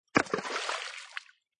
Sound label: Rock Splash, Stone or rock fall in water and impacting with other stones; Stone or rock fall in water and impacting with other stones in the water. Big splash.